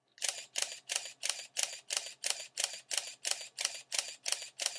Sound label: 400D Multishot